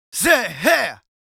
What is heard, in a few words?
Yells, Viking Vocal